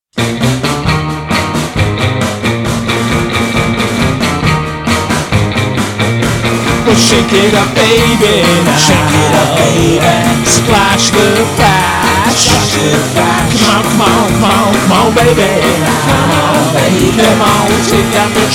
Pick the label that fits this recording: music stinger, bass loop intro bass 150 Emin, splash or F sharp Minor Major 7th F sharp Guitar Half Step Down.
splash